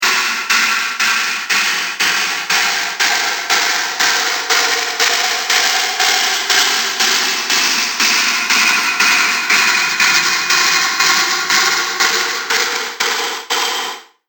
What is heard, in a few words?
Robotica Stomp, sci-fi sound effect; Robot Sound Effects; Andrew Fleming